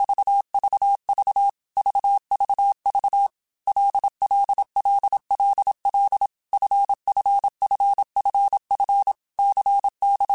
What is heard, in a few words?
LFCYQ, Morse Code; CW Code Groups; Basic Letter Groups By7Groups; HamRadio; Basic Sets